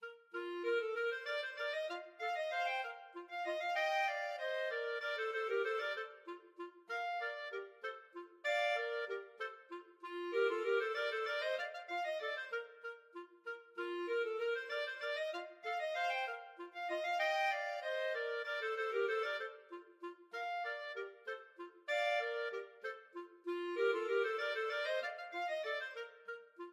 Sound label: clarinet duet